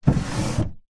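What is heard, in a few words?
Dresser Drawer Open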